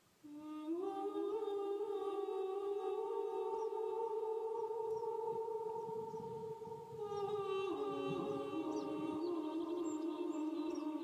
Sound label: She Moved Through The Fair [Irish Folk Song][Acapella][Kulning][Prairie sound effects]; birds chirping; singing